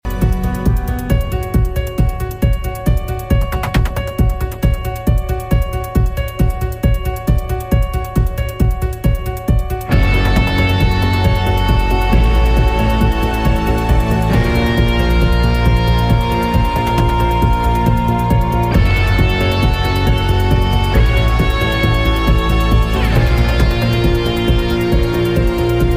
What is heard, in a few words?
ringtone